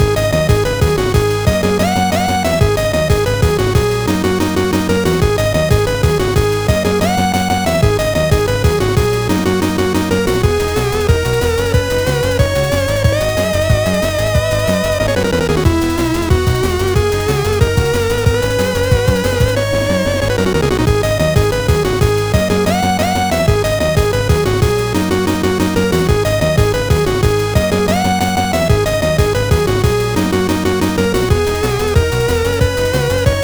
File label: raging fire; 牙と鋏